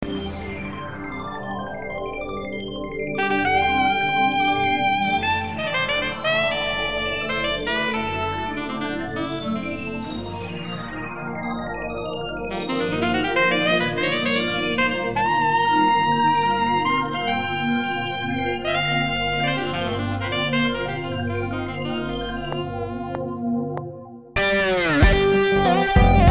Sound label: Funk Hard; Yamaha